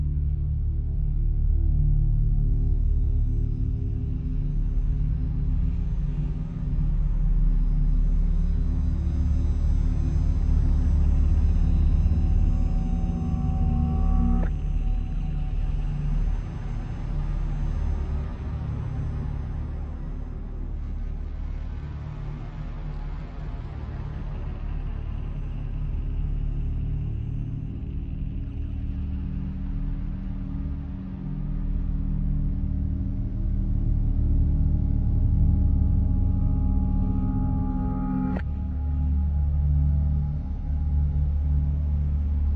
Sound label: Ambiance Underwater